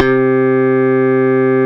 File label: Bas Clear C4, Fairlight CMI IIe